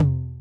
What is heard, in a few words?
999 Tom Mid, drum kit; Concrete Collage Drum Kit; Concrete-999